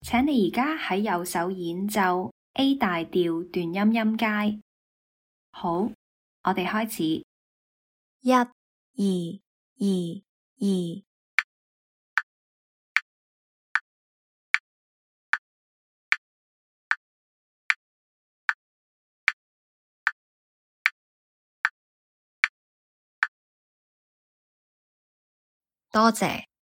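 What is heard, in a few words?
A major staccato scale right hand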